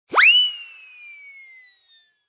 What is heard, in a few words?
Cartoon slide whistle up